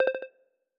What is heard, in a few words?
Ping